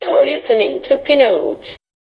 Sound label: jingle